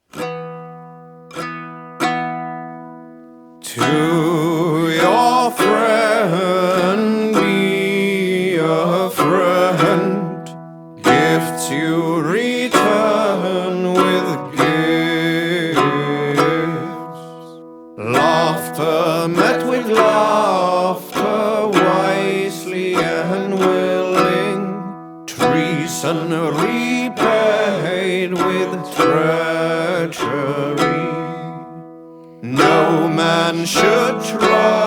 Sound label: kitara vocal chorus; Assassin's Creed Valhalla